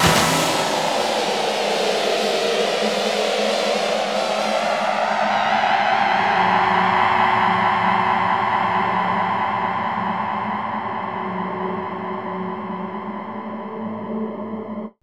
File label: BANSHEE, AMBIENT; AMG - Vince Clarke Lucky Bastard